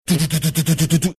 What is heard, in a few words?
imitating machine gun fire